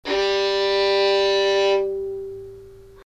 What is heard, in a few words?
wave g, string